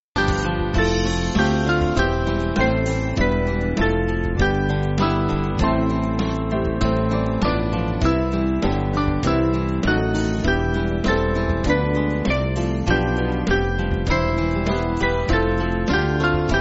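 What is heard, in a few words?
Band, Backing Files for Churches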